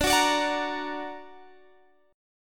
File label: D Augmented D down Guitar Standard; D+ strummed; JGuitar